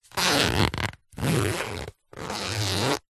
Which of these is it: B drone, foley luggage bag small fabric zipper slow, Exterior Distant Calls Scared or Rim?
foley luggage bag small fabric zipper slow